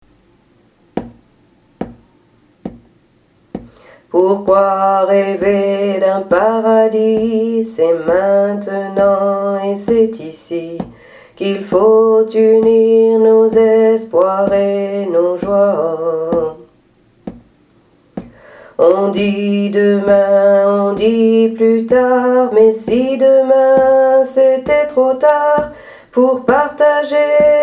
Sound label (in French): 3 voix chant tenor